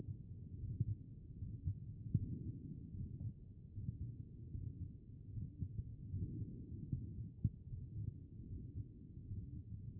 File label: HEART B MODE